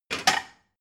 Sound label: plate cupboard take hard